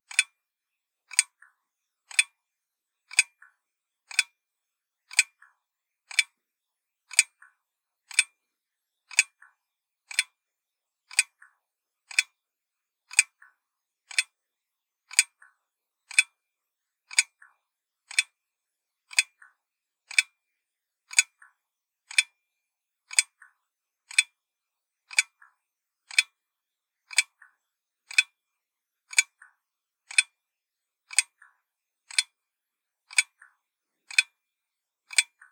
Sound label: slow ticking